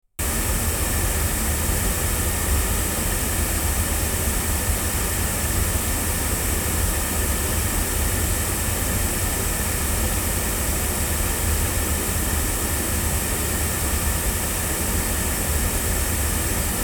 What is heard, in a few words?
sE Z5600a II omni loudspeakers, microphones; se electronics